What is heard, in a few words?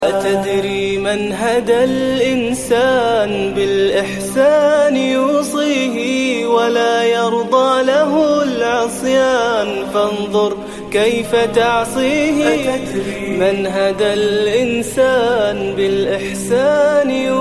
islamic Turkish Ringtone